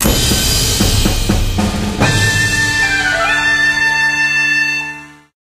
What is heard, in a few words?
rank two achieved